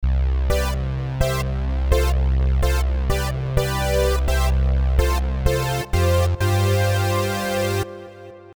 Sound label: Jump Brs